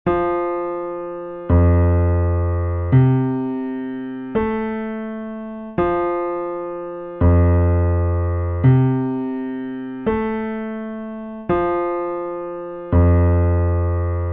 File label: impulse heel sitbone tip; note resonances